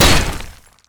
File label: anchorchain terrain; nautilus